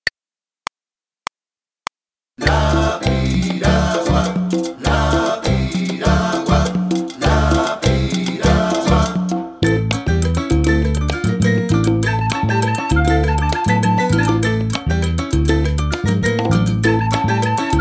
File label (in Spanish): Tercera voz Flauta